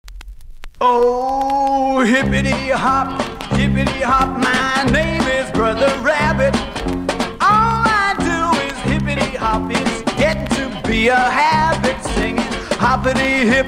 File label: Brer Rabbit Song